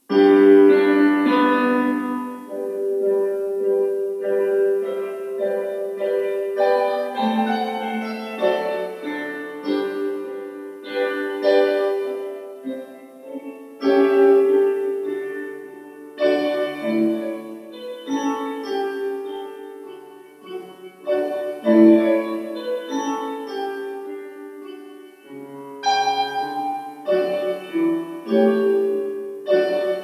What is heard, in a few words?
Quia Fecit ACCOMPANIMENT